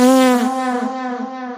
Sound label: fart with echo and reverb